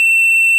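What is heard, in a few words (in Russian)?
звуки бип beep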